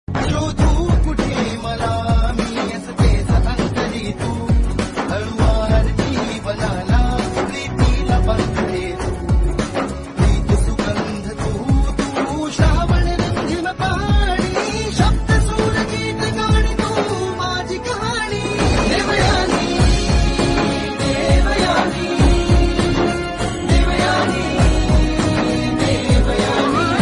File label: MARATHI SERIAL SONG RINGTONE; DEVYANI